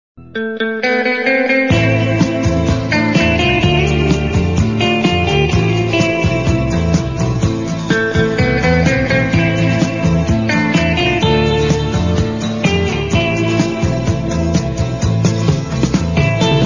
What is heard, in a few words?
guitar ringtone